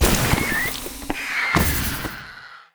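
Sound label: named death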